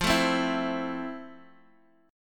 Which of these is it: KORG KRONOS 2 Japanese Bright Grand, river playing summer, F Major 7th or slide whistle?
F Major 7th